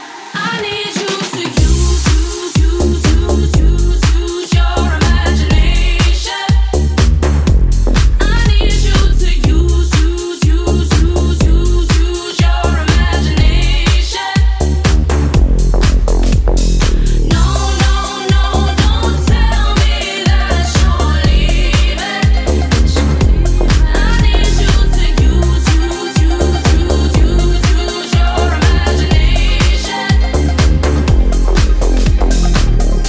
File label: deep house mix